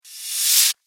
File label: Ascending Swish Transition; Whoosh Sound Effects